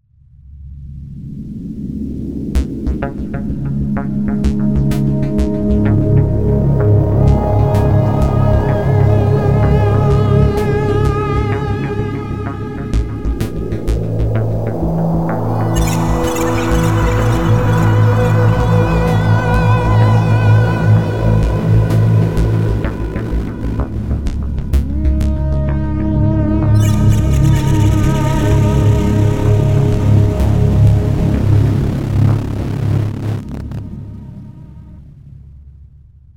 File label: Roland System 1M